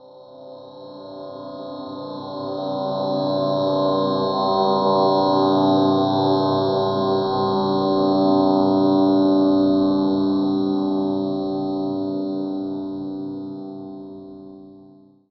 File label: Title Transition Stab Dark Long; Production Element